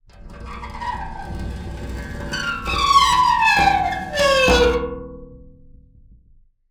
Pane Of Glass Rub

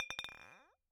Bottle Struck

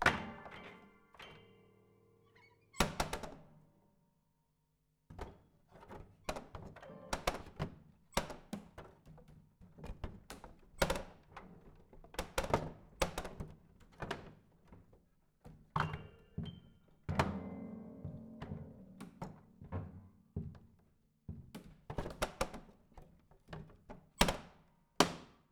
Panels Slap Sloppy, Piano Destruction

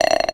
Bolt Glass Scrape, Medium Wine Glass

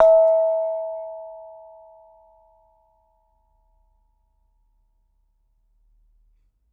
Bonang, Gamelan

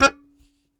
Very Short Note, Melodica

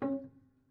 Prepared Piano

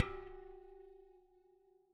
Bicycle Spoke

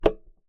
Key Noise, Melodica

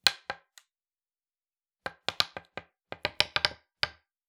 Palette, WoodenPalette

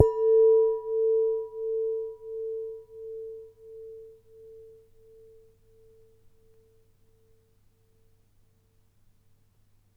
Soft Hit, Large Wine Glass

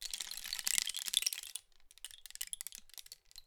Rain Stick, Percussion Samples

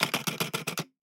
Camera Sequence

